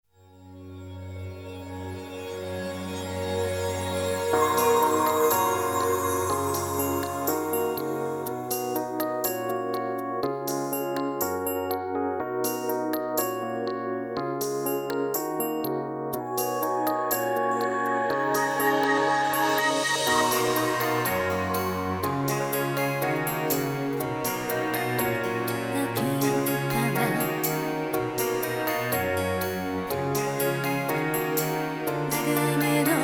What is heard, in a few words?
instrumental